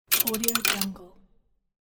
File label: inserting coin